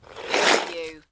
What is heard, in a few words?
Snow and Ice, Ice scrape moderate